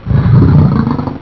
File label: die; kingpin